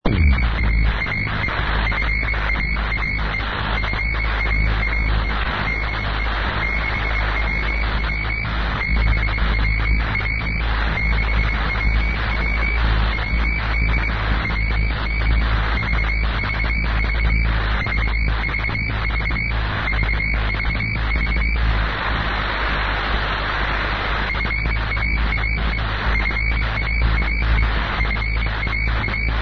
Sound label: cw vvv